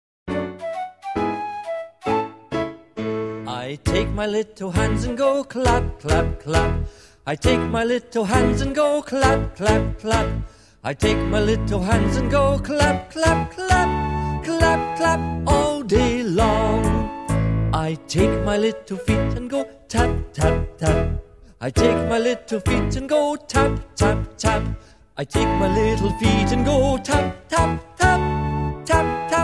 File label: Clap Tap Bend